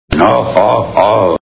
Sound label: ohohoh